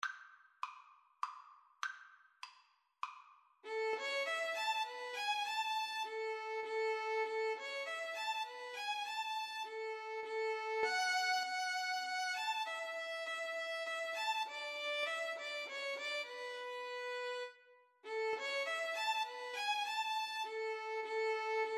minuet violin

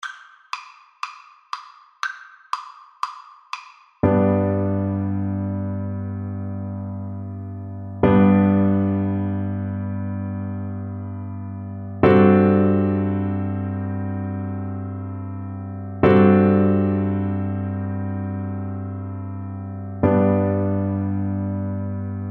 Double Bass

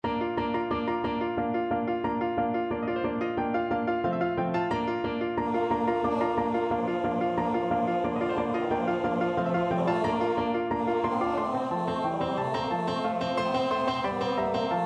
baritone voice; mozart fin chhan dal vino

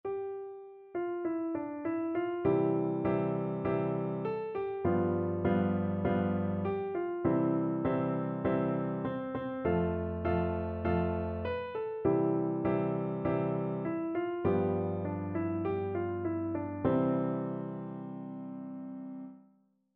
piano, piano duet

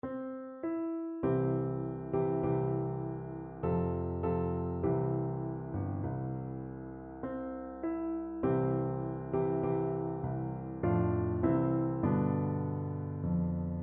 piano, piano duet

